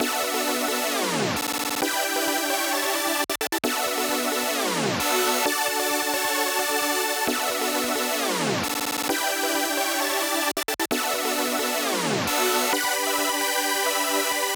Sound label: Saw synth; 130BPM; stems; Pony Fan Music Remix Stems